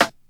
classic hip hop steel snare drum a sharp key, snare sound tuned to the A#